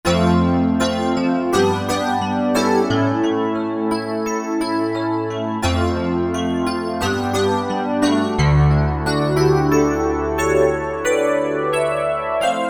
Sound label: Pop Rhodes, Roland SR-JV80-01 Pop